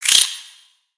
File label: Spider Attack Ranged; Lego; creatures mechanical